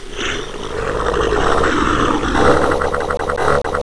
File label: alert, monsters; svencoop; AoMDC; face